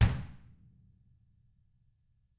DW HARD, Drums; DW KIT2

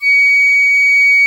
PICCOLO C#7, WOODWINDS; Greytsounds VOL-1

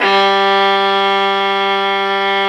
STR VIOLA, Str Quartet, Strings

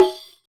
Rek Dom Open, Percussion; Roland BK-5

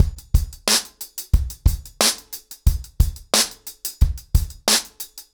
ACOUSTIC DRUMS, 90 BPM

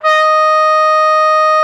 TROF D#5, TRUMPET; Xsample VOL-3

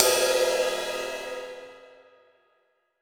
Ride Cymbal; Essential Percussion

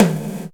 Gated Kit, Percussion

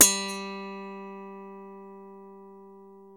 GTR FNK GTR, Clean E.Gtr, Death Strat; Roland L-CD701; Clean E.Gtr5

